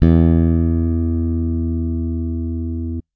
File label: bass electronic, electric bass finger